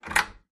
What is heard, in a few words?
stall door latch; latches & bolts